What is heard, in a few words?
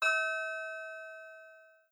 pile resonate quiet